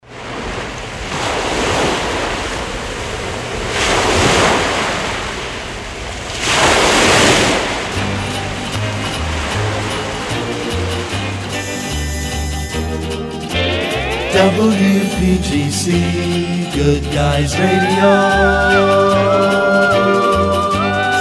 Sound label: jingles jet set surf sfx guitar flute; pams series 27